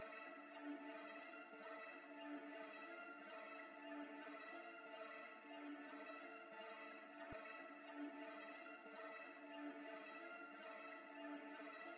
131 BPM D Min Strings; Trap Melody Loops; Loop Stems; Cymatics; Nightmares Trap Melody Loops; Last Call

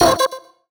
Retro FX; Cymatics; Video Game Sample Pack Vol 1

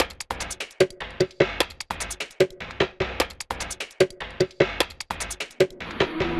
Percussion Loop 150; Drums & Percs; Percussion Loops; Ghosthack; Dimensions

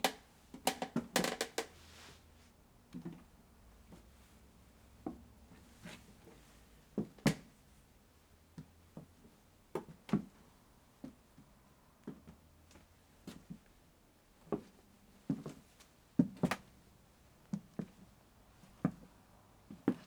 Creaky Attic Floor; Fairlight Sound Lib

